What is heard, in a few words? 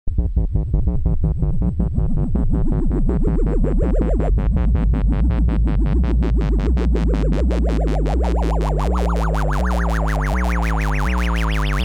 LC Cell UFO